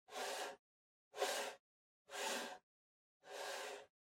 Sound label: PC Mouse Move Far Wood Mid